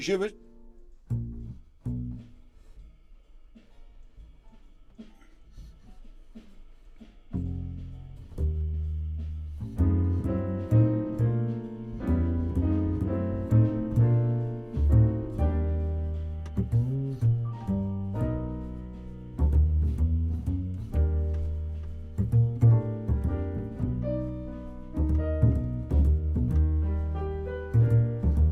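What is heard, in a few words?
Contrabass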